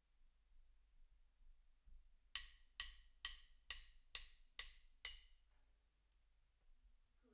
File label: Room